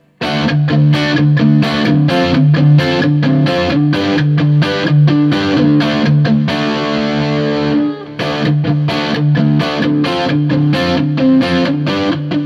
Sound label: Guild S300; Backline; D-Shape; Axe-FX Ultra; QSC K12 speaker